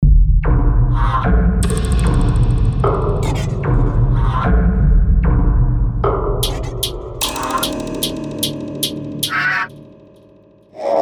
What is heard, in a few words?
Thunder Drum; Bounty Hunter; Soniccouture